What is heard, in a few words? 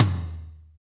TOM TOM